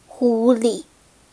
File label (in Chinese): 受击; BattleCry; 薇薇安